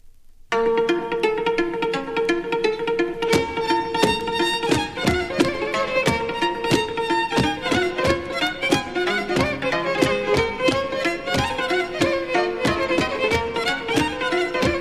Ciuleandra - Roumanie

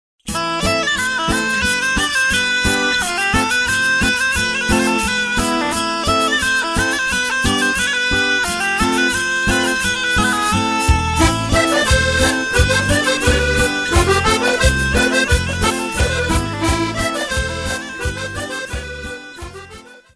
Scottish, France - Limousin; Scottish des Troubadours

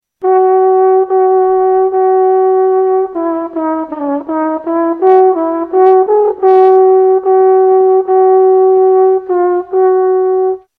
saqueboute

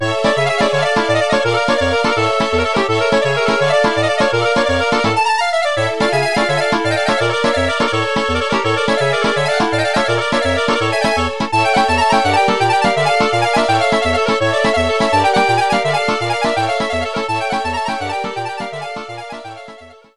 Iliikovo Horo, Bulgarie

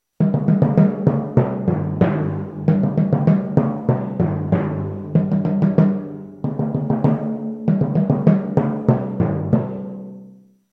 timbales d orchestre

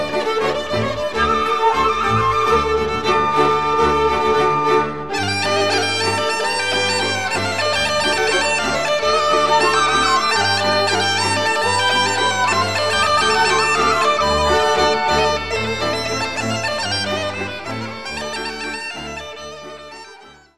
Thrace Horo, Bulgarie

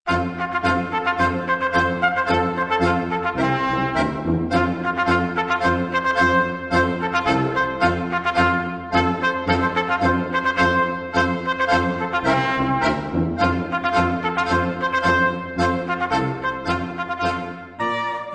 Jagermarsch - Autriche

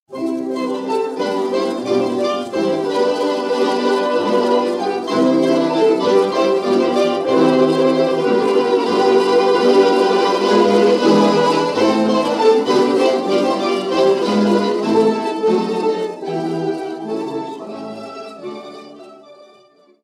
Nevestinsko Horo, Macedoine

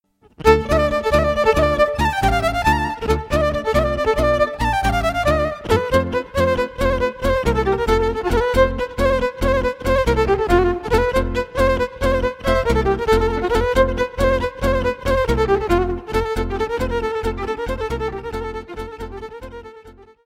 Briul batranesc pe 6 - Roumanie

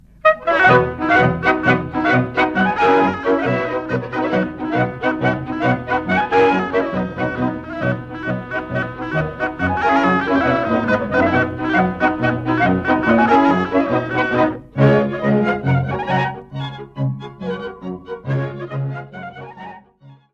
Polka - Republique Tcheque

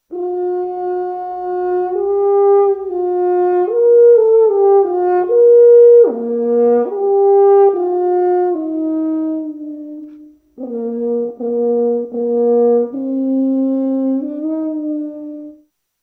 cor a main; instruments

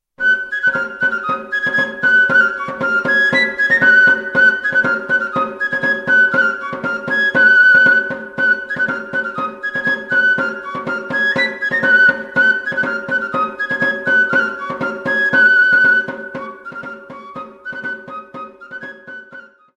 Arku Dantza, Espagne, Pays Basque

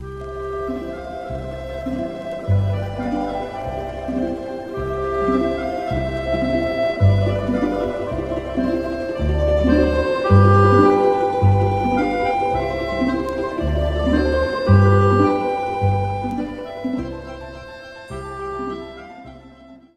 Chorovod - Russie; Weseni Chorovod

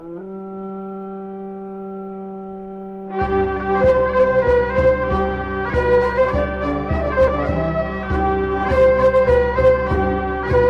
Maclote, Belgique

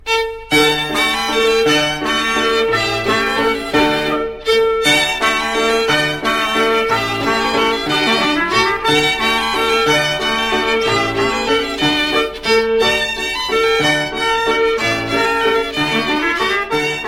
Passe-pied, Belgique; Bastogne

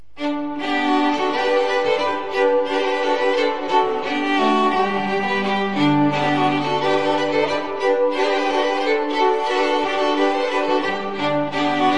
Maclote Trois-Pont, Belgique; Maclotes de Trois-Ponts

